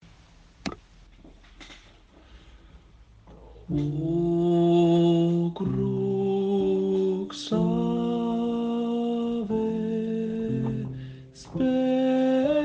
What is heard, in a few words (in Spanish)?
Sopranos; O Crux Ave